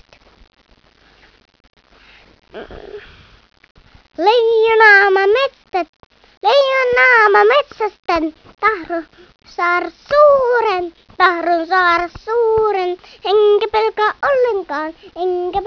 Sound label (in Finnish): leijona